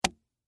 Game Play Money, Stack, Drop; Sports; Board; Monopoly; BlastWave

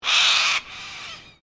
Bird Little Corella Call, Birds in Queensland; Birds (Sound Effects:Animals)

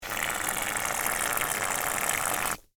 Mousse Can Spray, Household, Mousse, Can, Spray; Spraying (Sound Effects:Objects); BlastWave

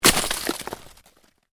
Ice impact heavy, Ice: heavy impact with debris; Snow; Weather; Stuart Duffield

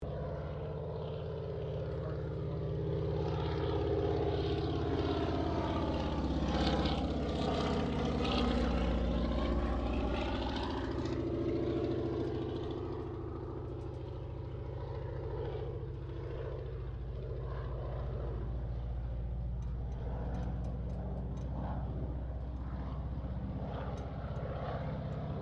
AIRCRAFT 1916 SOPWITH PUP REPLICA PASS STEREO; Replica of a 1916 Sopwith Pup; Airplanes; Vehicles

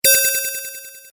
Alarm, High Tech; Alarms; Electronics; loop; Stuart Duffield

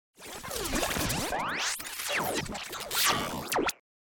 Stinger Warble Rear To Front; Movement Production Element Imaging Element Accent Transition; Blastwave

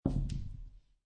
Dice Throw Two Dice On Felt; Casinos; Lynne Music

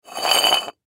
Clay Pigeon Slide Scrape Cement Surface; Sports; SoundEffects-Blastwave

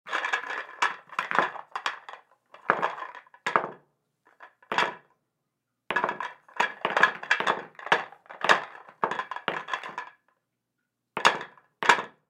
Pool Balls Rack, Pool Balls Rack Rearrange Balls; Billiards; SPORTS; Pool (Sound Effects:Sports)

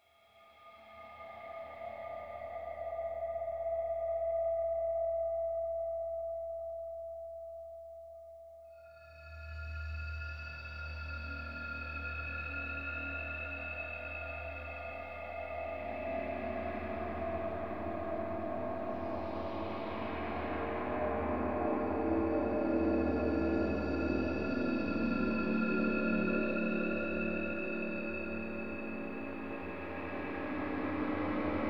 Horror Drone Bed Swells Eerie; Scary Tones; Blastwave; Sound Effects:Horror

